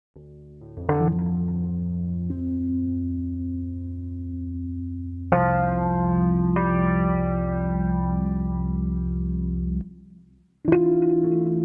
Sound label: rhodes